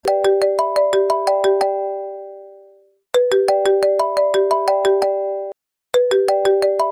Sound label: 12 pro max ringtone, iphone ringtones